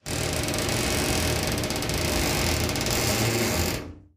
Vault Outer Door Open; safes & vaults

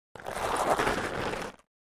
Object Dragged Acros, rocks & dirt

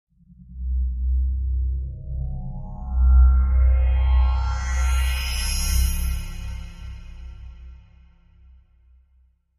Wash Mystical Elect

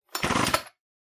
Chain saw chord being pulled and released.; Tools, Hand Wood, Sawing Saw, Chain

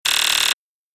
Alarm Ray Gun Beep; hi-tech various

